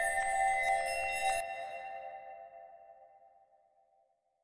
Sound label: Magic Bomb